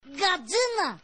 gallina

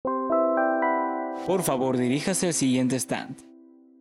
timbre

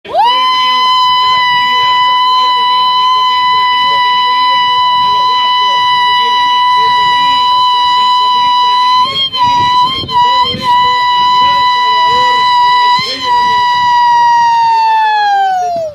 grito sapucai

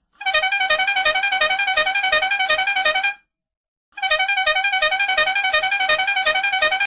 bocina coche choque

